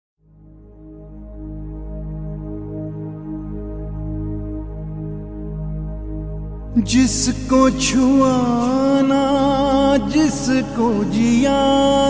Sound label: Sad Version